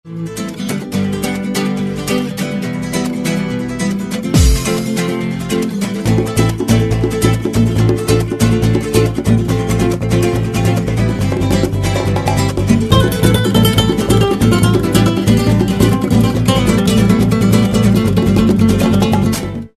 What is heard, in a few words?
guitar